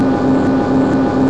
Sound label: fan; quake2; mnhcoop